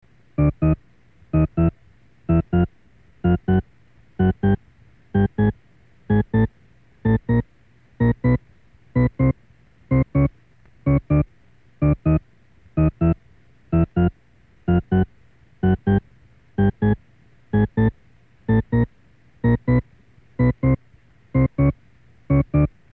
shepard, mono

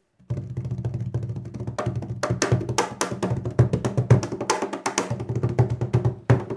cajon; lateinamerika

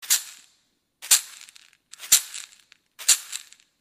caxixi; lateinamerika

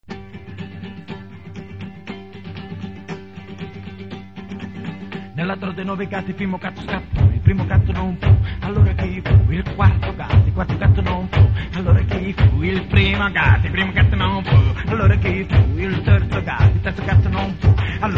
tarantella rap

